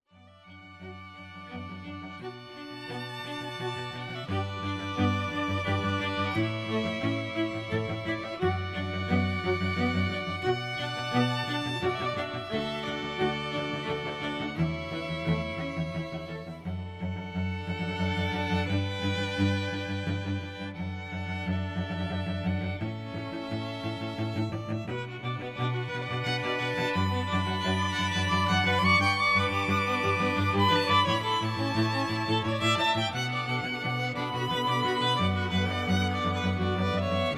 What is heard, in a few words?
string quartet